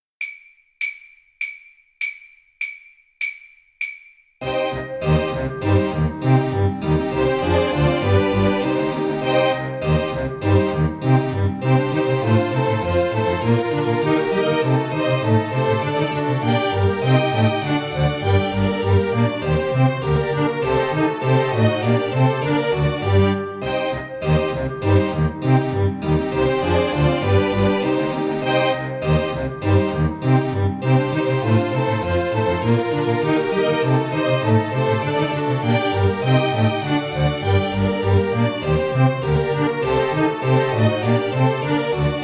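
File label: bach badinerie, alto saxophone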